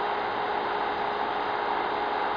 AMB

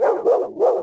BARK; DAoC; Toa

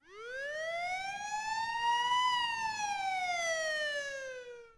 SIREN; PC Gamer IT CD 49; UrbanChaos

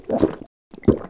slurp; barfly

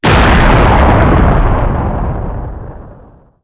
Boom; Falcon4

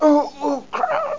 die

